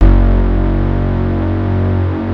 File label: DEEP